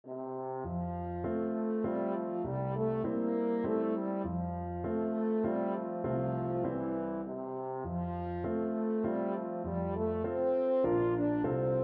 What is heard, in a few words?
afton water, french horn